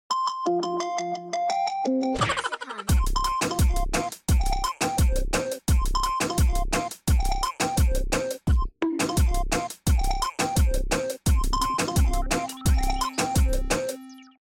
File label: Alarm; en Brasil